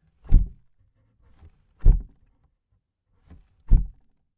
lid close wood

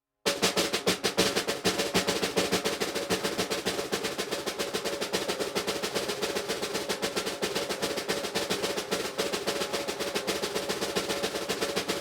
snare roll tumbling

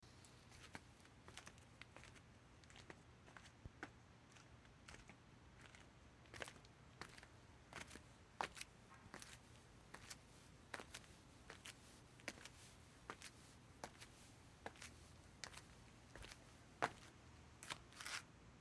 flip flops on concrete

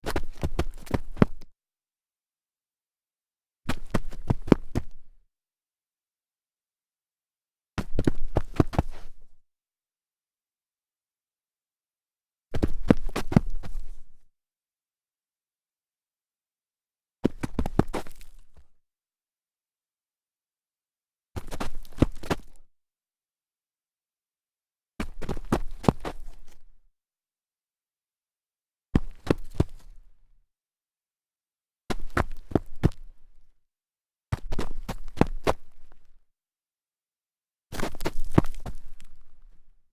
stumble dirt, foley

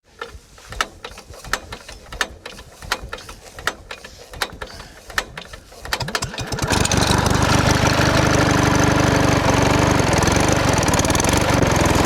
baler startup and run

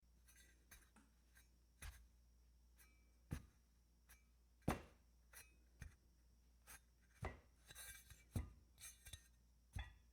metal shuffling

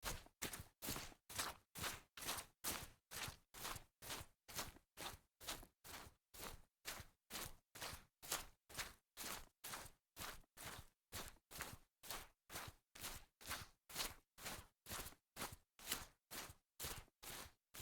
walking fast on muddy grass

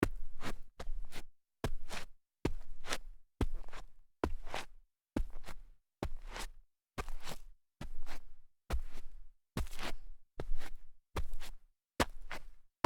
sneaker toe slides dirt

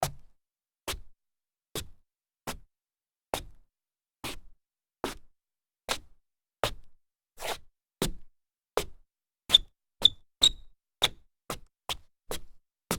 sneaker toe slides solid wood, foley

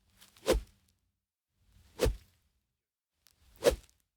golf swing practice

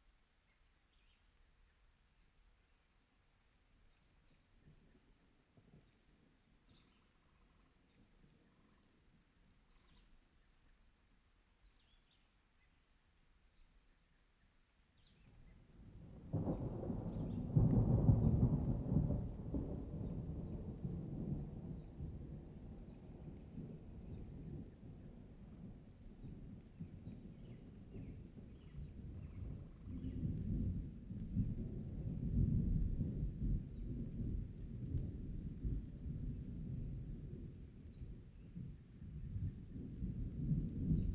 summer thunder and rain

